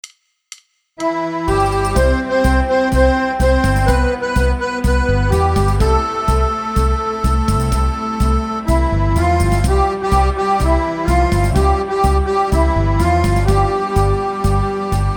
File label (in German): Akkordion Tango; Wersi